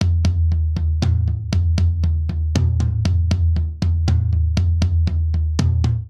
Straight Three Rock Toms Only 3_4 118 bpm; MIDI Clips; Drum; Ableton; Factory Packs; Drum Booth

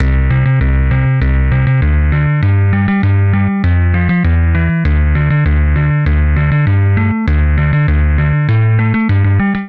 Bass C Major 99 bpm; Bassline; Tonal; MIDI Clips; Mankey; Drive and Glow; Ableton; Factory Packs

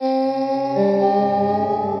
Chromatic Voice Glissandi, Transformed Voices; Ableton; Factory Packs; Voice Box

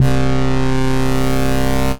Wonder Bass; Ableton; Factory Packs; Chop and Swing

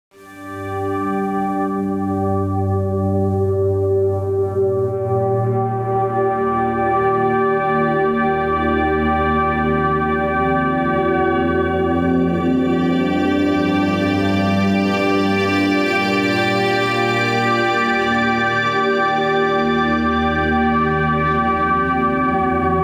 Ethereal Strings - Gmin 84 bpm, Atmospheric; MIDI Clips; Tonal; Ableton; Factory Packs; Mood Reel

